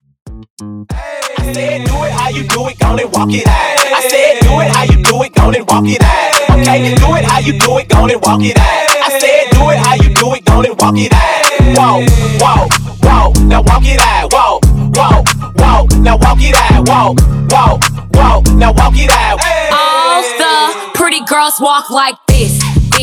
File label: Hype 94; remixplanet